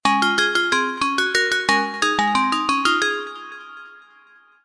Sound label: Molecular Formula, ringtones; Itel S25 Ultra